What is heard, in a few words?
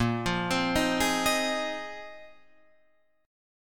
A sharp 7th Suspended 2nd Suspended 4th, Guitar, Open F; A#7sus2sus4; arpeggiated